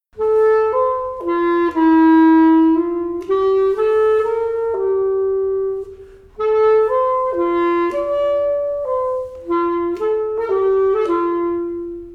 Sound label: bassett horn